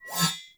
Bayonet Melee Whoosh Neutral Accent; Warframe; Tenno

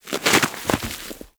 Armadillo Move Snow; Warframe; Characters; Hunting Critters

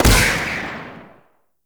Rifle Shot Initial C; Warframe; Zariman Grn Wraith Rifle

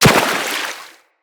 Run Water, footsteps; Operator

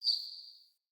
Bird Single Chirp; Galene Bird

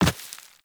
Walk Rock, Footsteps; Operator

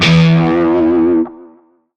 Shawzin Flourish Oct One E; Music; Warframe; Narmer Shawzin

